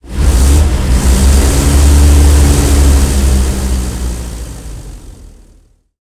stug40 engine load, tanks; War Thunder

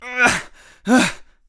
death; Escape from Tarkov

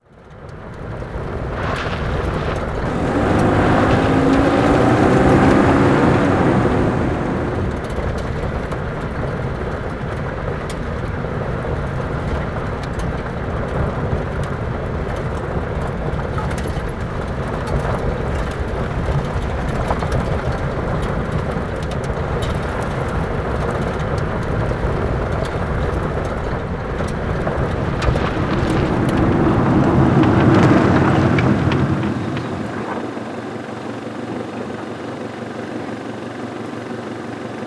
car move; Modern Warfare

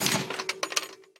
12mm dshk mech, guns tanks

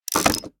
roulette end; War Thunder

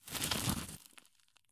soil stop; Escape from Tarkov

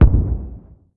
expl dist

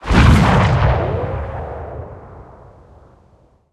cannon 37mm tail far, guns tanks

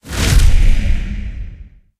module fire, tanks

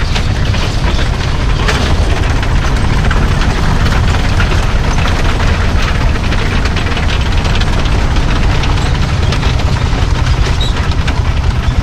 tracks, engines tanks; War Thunder; maus

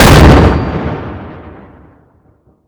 Projectile Sounds; Red Orchestra 41-45

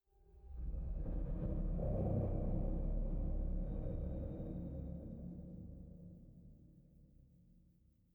sting metal groan; Rust

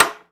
Aircrafts MiG-15bis Ratchet; DCS

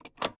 Aircrafts P-51D Cockpit Control Lock Off; DCS

